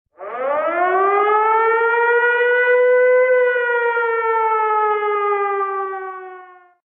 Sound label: land-based air raid siren